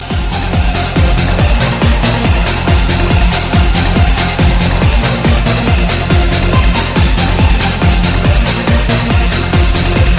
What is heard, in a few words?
Christmas Ring Tones